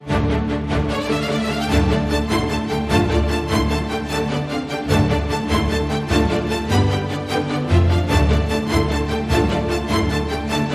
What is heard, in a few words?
Strings Only